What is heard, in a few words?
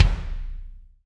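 bass drum, Roland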